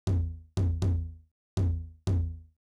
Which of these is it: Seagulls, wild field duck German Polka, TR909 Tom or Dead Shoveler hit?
TR909 Tom